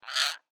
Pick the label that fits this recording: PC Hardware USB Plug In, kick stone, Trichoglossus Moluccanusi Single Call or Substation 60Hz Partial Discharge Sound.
Trichoglossus Moluccanusi Single Call